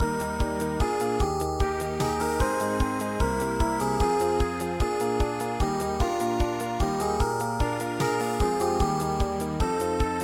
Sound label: land der berge land am strome ballade